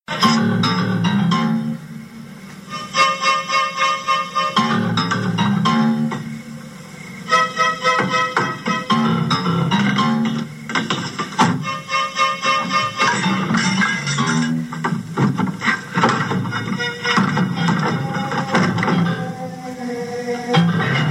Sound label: suspense theme